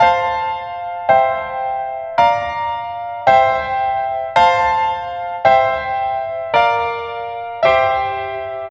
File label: Piano, Trip-Hop Loops; Sonic Foundry (Sony Creative Software) - Rondo Brothers Trip-Hop Loops & Samples; Apologists